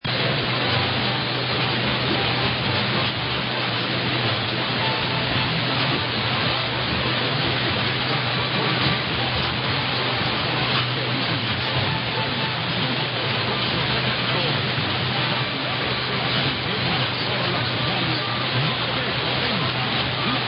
Venezuela; 940 Radio Punto Fijo

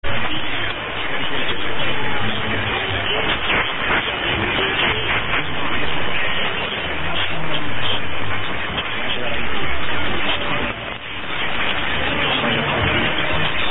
music and talk

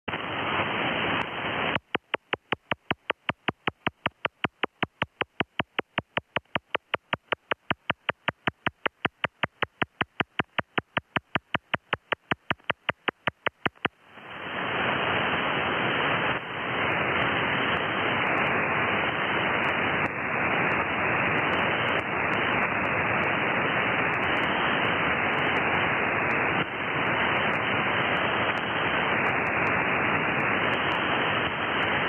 wideband poss OTH or other noise source

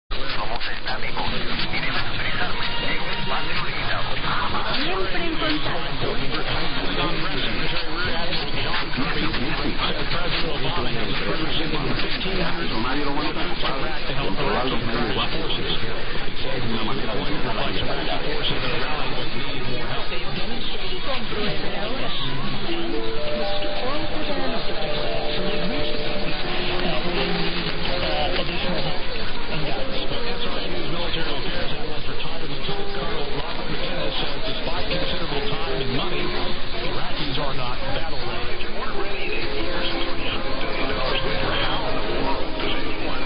990 Cuba ID chimes; R.Guama

